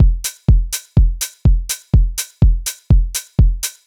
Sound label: Offbeat Hats